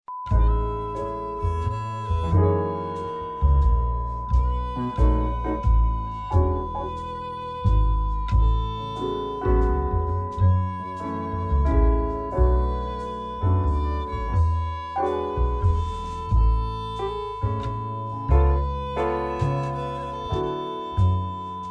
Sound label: cheerful time